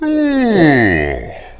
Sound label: ouuhhh